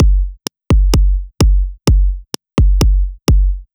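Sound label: Drum Loop 128BPM bass drum; VEC5 Drumloops; VEC5 Multi Loops; Loop Elements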